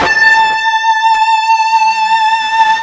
FUZZ A 4, ULTRA FUZZ, Guitar; East West; Steve Stevens Guitar Samples Collection

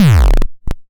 Vector Kick; Cwejman S1; DevSnd Cwejman S1 - JK

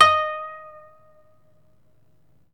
KOTO MILD

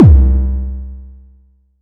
Kick; ModelCycles

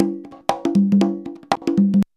CONGA 119

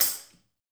tamb, metals; Spectrasonics; Liquid Grooves 1 & 2

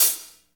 HH IH, HH Real

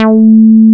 BASS A4 MP; MR.J BASS

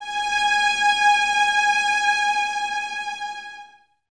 violas G#5, String Ensembles; Miroslav Vitous; VLS 1+2

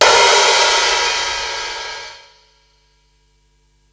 Hihat open, Psychedelic Drums

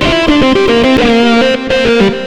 xtra lead

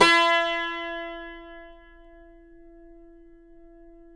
CUMBUS E, Guitars; Spectrasonics; Hans Zimmer Guitars VOL-2

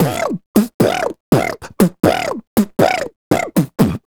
Beat Box, Hip-Hop and RnB Vocals

